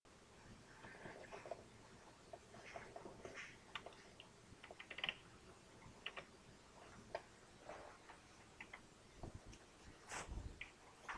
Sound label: cry